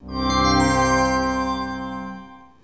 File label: Win City